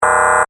Beep Negative Chime, Scifi, Beep, Negative, Chime, Science, Fiction; Tech; BlastWave